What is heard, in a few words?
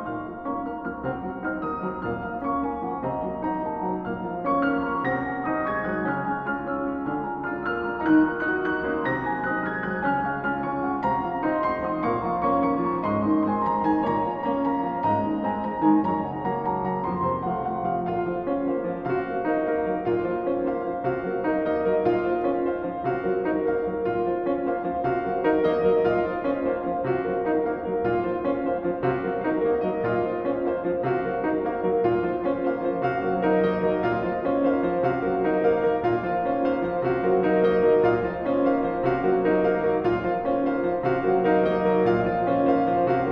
Canto Ostinato, Minimal Piano